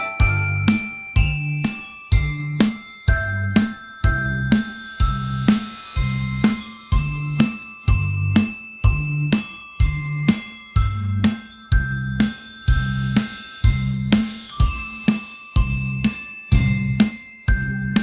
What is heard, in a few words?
generated music; ai; music generation